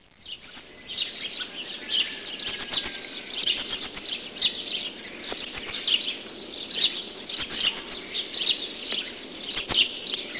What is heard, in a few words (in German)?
blaumeise; park; voegel